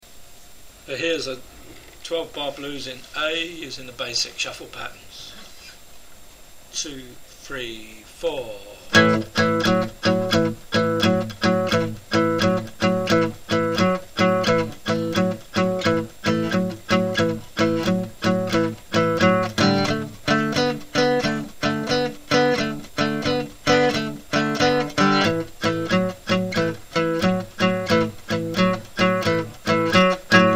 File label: shuffle in a, guitar